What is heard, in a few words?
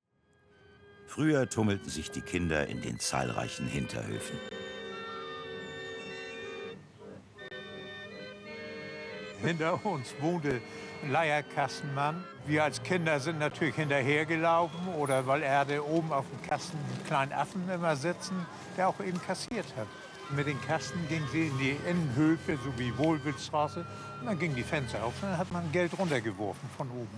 Taps